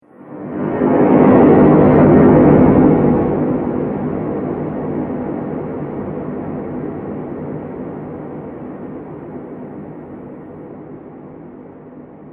Space War Star Cruiser, science fiction sound effects